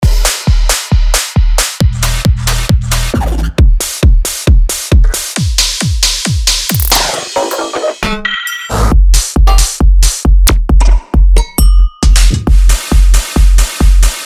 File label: drums; revealed essential drums vol 1; revealed recordings